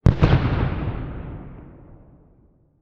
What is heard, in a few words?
Explosion Distant Gas Eruption; Fire and Explosions